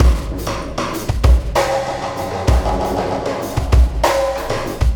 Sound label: Oscillation Beat; Sonic Foundry (Sony Creative Software); Processed Drumkits Zero Gravity Beats; Oscillations